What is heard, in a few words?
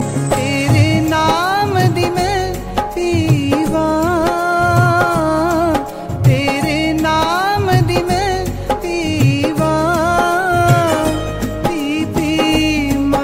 great guitar delay; country